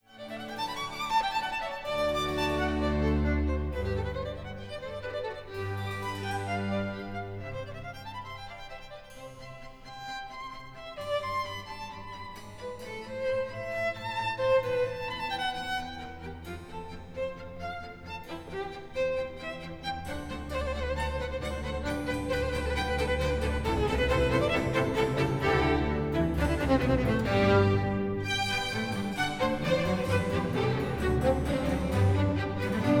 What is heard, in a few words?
Haydn G major Allegro; soundbite